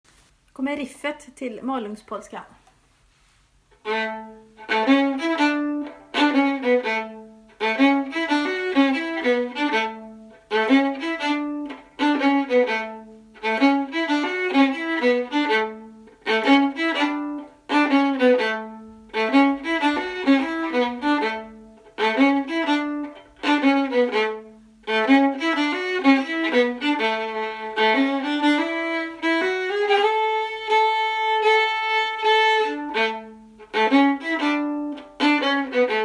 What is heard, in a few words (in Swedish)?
Malungspolskan, riff; av Mikael Marin